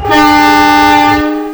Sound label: Klaxon, Train; OpenBVE